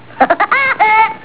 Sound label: laught